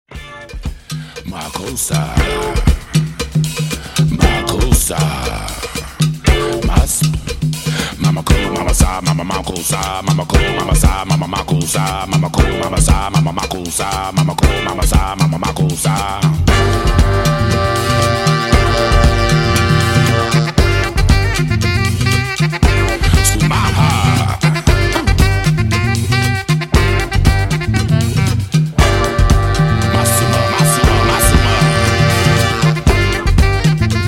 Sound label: Afro Rock Band